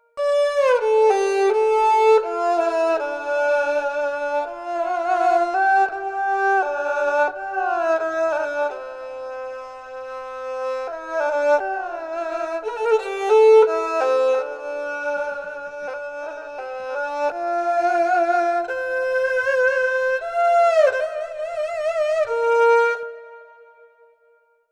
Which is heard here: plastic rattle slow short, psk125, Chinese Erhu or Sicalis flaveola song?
Chinese Erhu